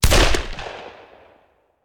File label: Hand Gun; Sound Ideas; Guns